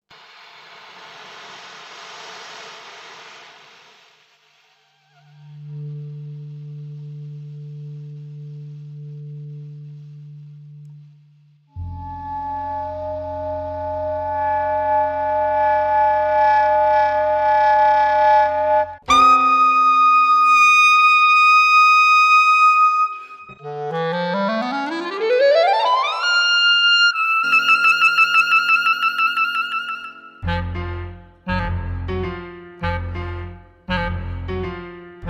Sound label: clarinet piano